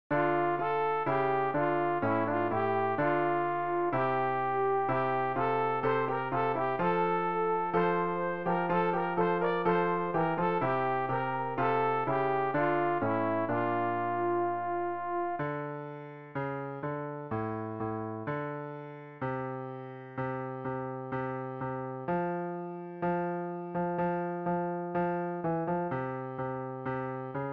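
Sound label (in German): sopran, Übehilfen für das Erlernen von Liedern; ref gesangbuch; rg 234 agios o theos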